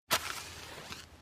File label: flame; The Witcher Hunt